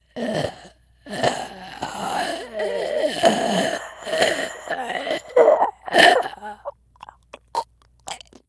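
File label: ambient zombie; cstrike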